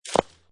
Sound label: Rock Fall On Leaves, Rock impact with ground, other rocks, leaves and twigs; Crunch Sound Effects